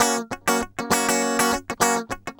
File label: 100 BPM